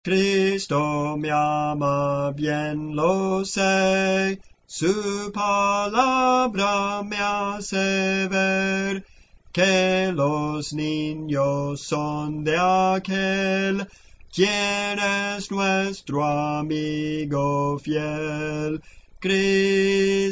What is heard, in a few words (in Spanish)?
Contralto, Cantos Espirituales